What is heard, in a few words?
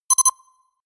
notification pager